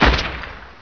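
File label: grunt machine gun; hgrunt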